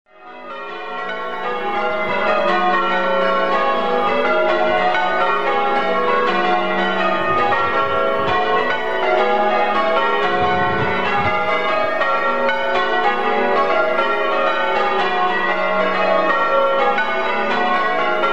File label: bob major, tower; lanhydroc